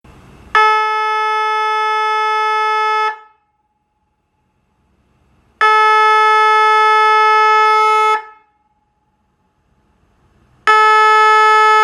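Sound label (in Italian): Pulsato Vedetta, Sirena elettronica di grande potenza; Vedetta ABM 500 4TA; lasonora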